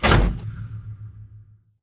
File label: sliding door ext verb open stop; Titanfall 2